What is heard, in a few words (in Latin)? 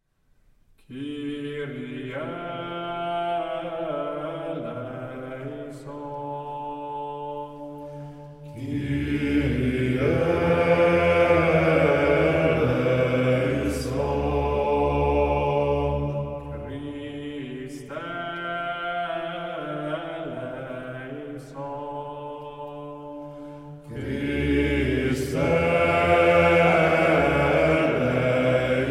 Litaniae Sanctorum